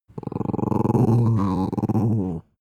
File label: dog small growl soft; natur-tiere; hunde